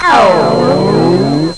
ouw; TetrisPro

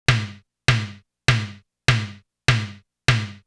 toms; SongBook

